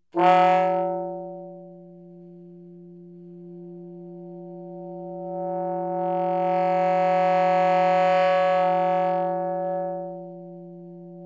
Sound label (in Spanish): ADSR, saxofón multifónico